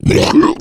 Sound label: male boomer disrupt vomit, zombie; Left 4 Fortress